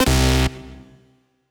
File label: error